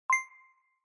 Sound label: Onoma-Inspiration (Mid High)